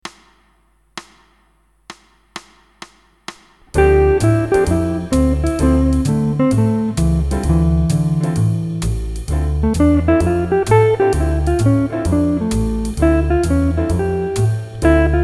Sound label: Minor 7th Licks Etude, guitar